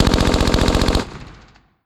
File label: ger jet fire; Hearts of Iron IV Death or Dishonor